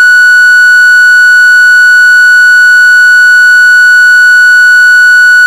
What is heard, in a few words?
emv wind, whelen gamma sirens